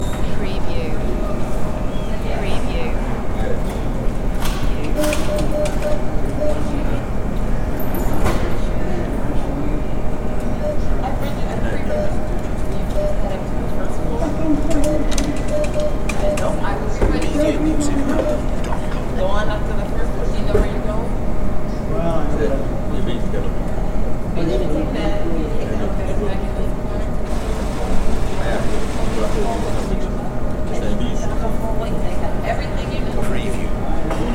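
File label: Hospital Ambience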